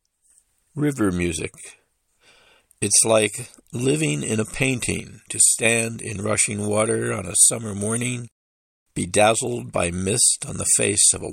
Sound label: River Music; sculpture park